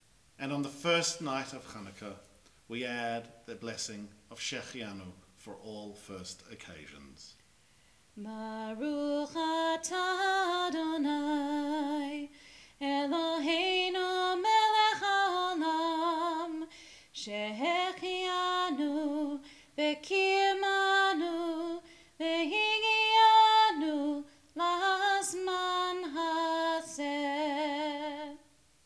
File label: Shehecheyanu Chanukah; chanukah candle lighting